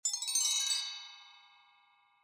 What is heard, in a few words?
tone